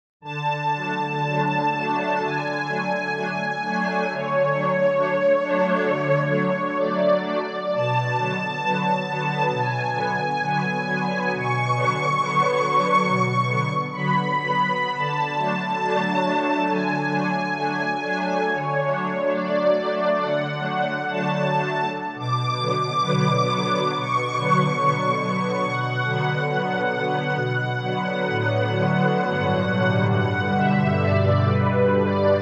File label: Saw Strings, Korg MSC-1S; Korg MSC-1S Variety